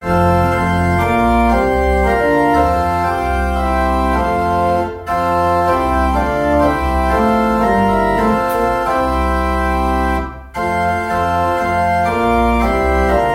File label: Mayer, pipe organ